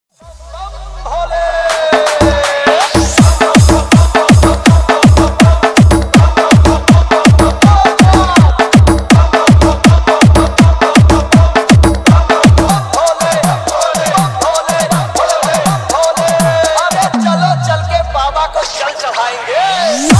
Bholenath dj remix ringtone

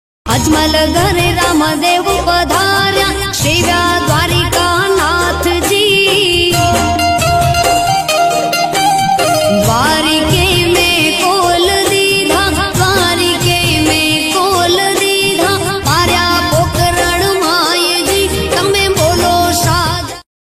ringtone gujarati; ramdev ji

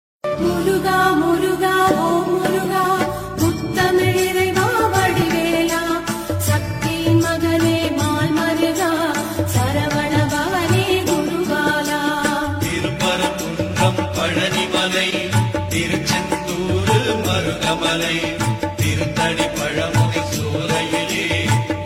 muruga muruga om muruga ringtone

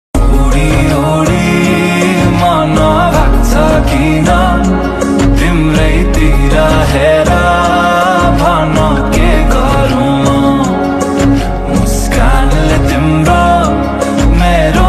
sarangi ringtone

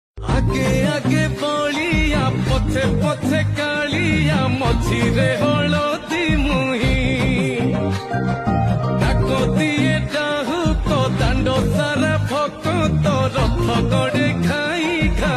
Ringtone

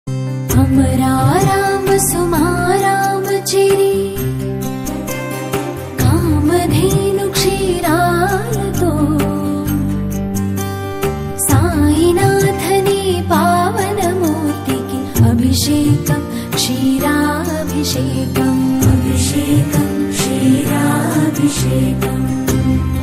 ringtone tamil; saibaba

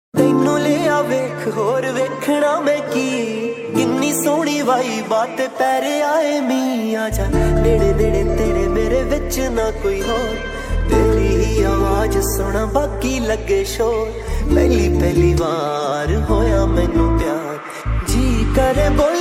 punjabi heart touching ringtone

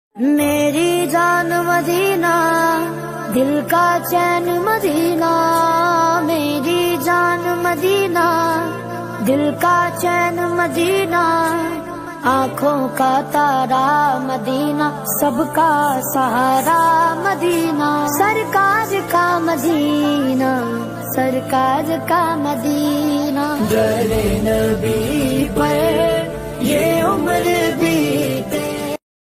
Naat Sharif ringtone

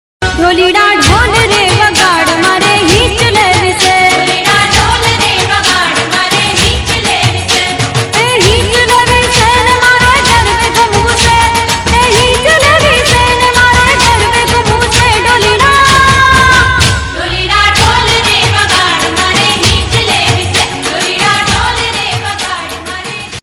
Dholida dhol re vagad ringtone

